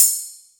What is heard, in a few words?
Bells